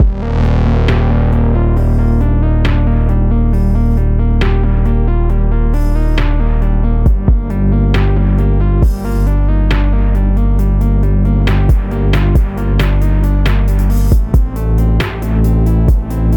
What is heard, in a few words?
City Ruins Stem; Epitasis